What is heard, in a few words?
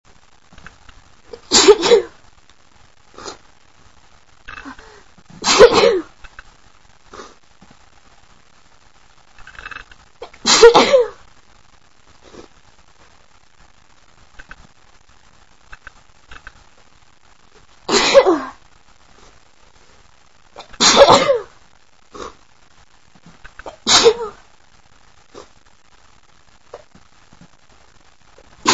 allergy sneezing, female